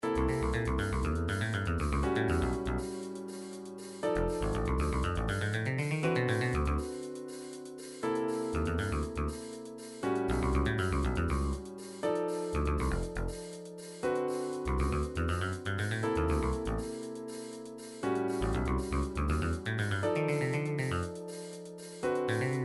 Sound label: jazz, midi